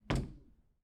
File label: door bangs; prosoundfx